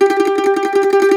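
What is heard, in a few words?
MANDOLIN TREMOLO, Plucked Strings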